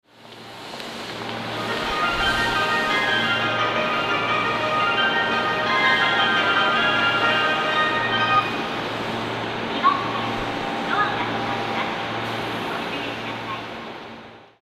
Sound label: ebisu melody, Tokyo Train Tunes; japan